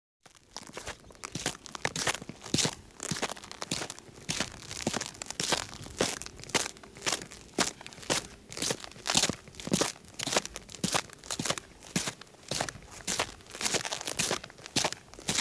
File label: footsteps on a grass; Grass and Dirt; Butterfinger Video Sound Effects